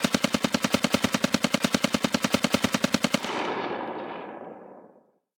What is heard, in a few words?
combat atmosphere; World of Tanks